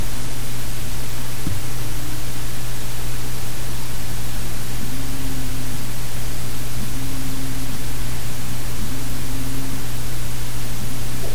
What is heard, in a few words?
vibration